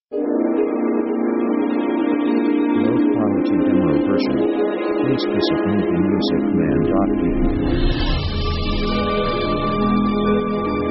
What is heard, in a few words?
orchestra mystic shimmering; orchestra themes